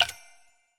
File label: spin Button; imperialriches